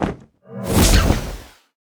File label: tenshi death